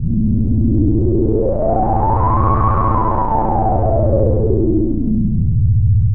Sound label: Mini Swell; SFX & One-Shots